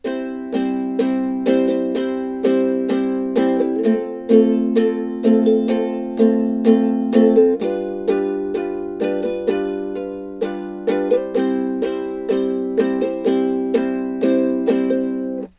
slow lick, ukulele

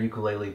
ukulele

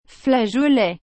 flageolet, ukulele

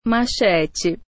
machete

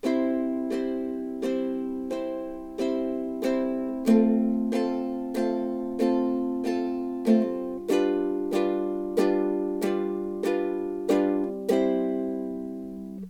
slow straight, ukulele

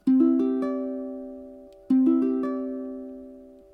chord cadd9, ukulele

